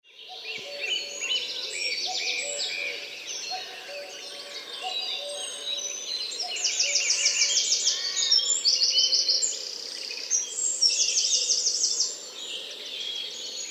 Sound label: cuckoo, bird, suffolk, fieldrecording; fynn valley